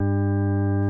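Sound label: CX3 overdrive, organs; Greytsounds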